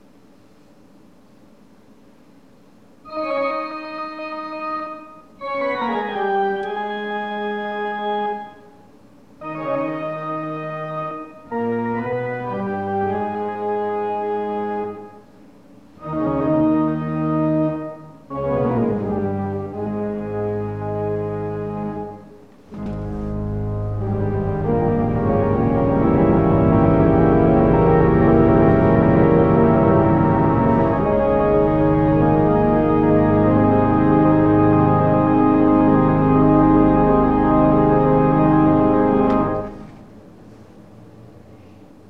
Mars Organ

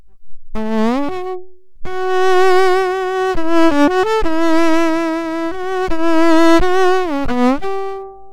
bowed string force on bridge; Woodhouse

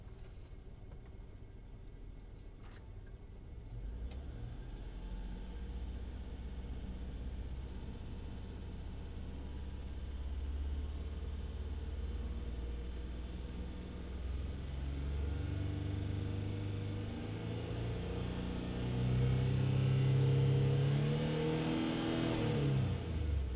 Car Sound Audio

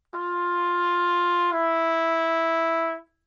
one semitone

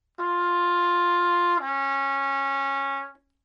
two steps

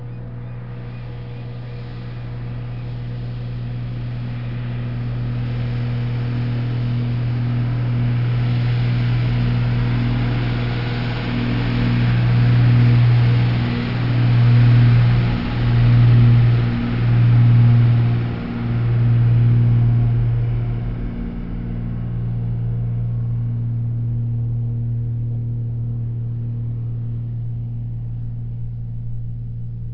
truck

